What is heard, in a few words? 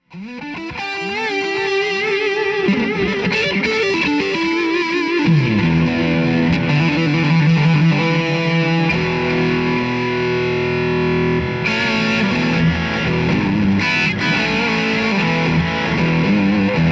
Opto Line6 ODR1